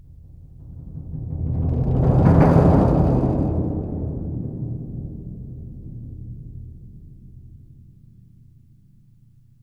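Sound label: roll, left, Percussion; Miroslav Vitous - Percussion & Harp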